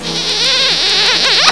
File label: KISSSS